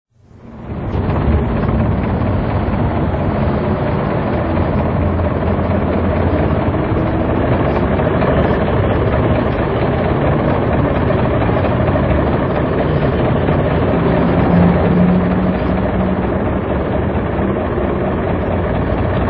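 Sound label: noise of heavy machinery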